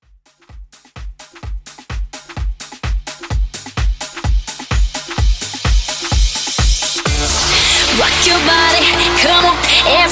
Dance House 128 Bpm

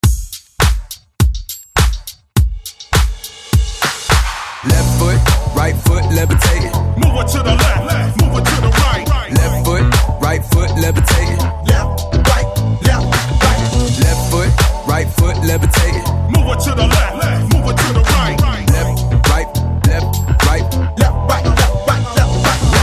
mash breaker hype 103 bpm

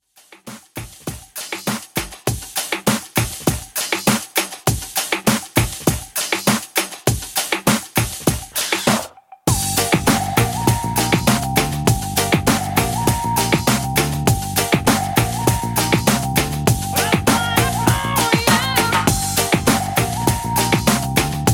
Club Classic Redrum 100 Bpm Clean

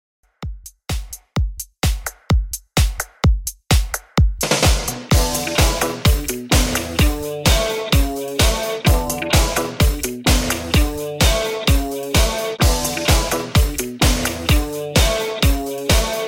80s Soul Redrum 128Bpm; remixplanet

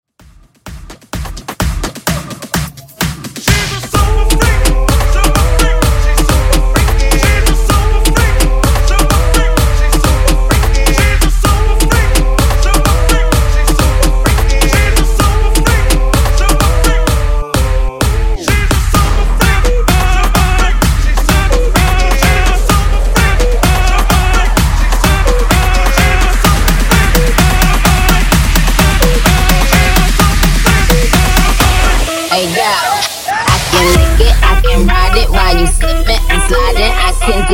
Funk Mash Up 128 Bpm

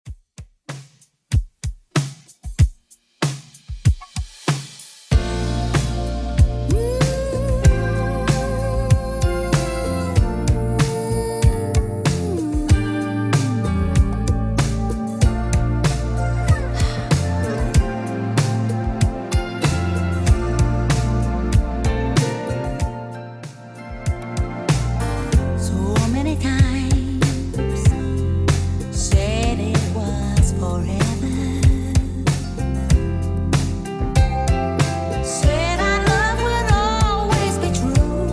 70s Redrum 95 bpm

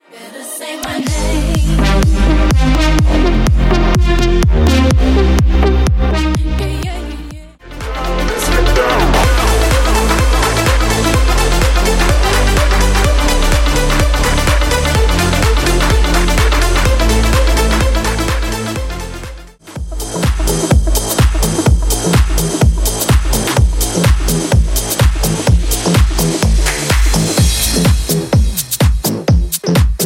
EDM Megamix; Remixfreakz

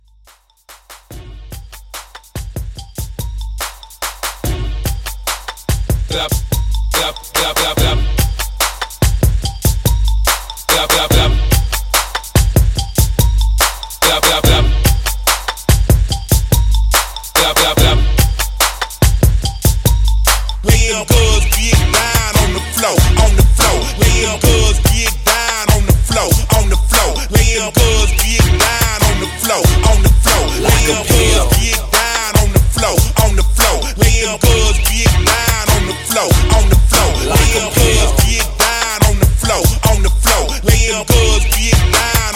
Old HipHop Redrum 144 Bpm

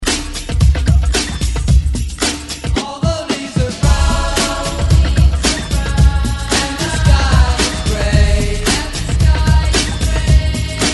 60s ReDrum 112 Bpm; DJDOLLS

